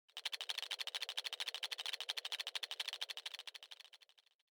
Trembling Cold

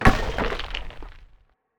Brick Crash

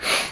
Sniff

